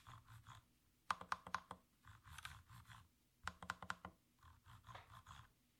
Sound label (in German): Cykey Knopf